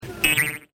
boing

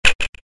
Communicator

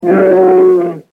bear hit

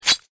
knife hit

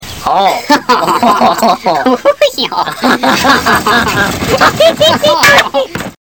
laugh

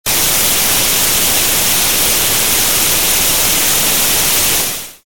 White Noise Only

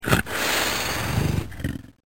hiss growl

